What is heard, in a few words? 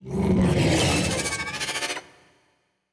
cutholoids emerge; Stellaris; grand archive; ships